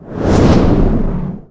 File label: Wall camera zoom; Kelly Slater Pro Surfer; macOS, Windows gamerip